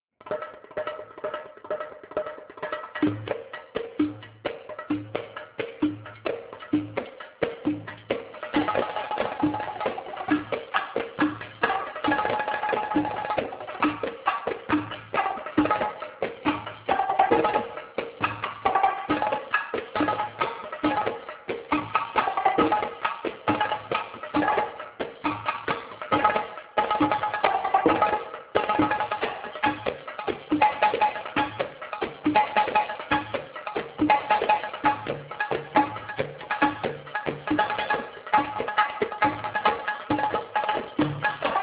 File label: Drum Solo